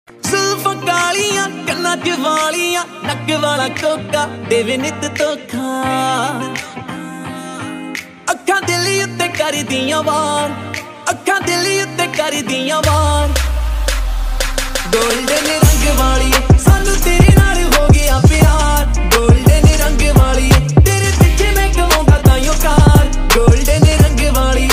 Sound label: New Punjabi Song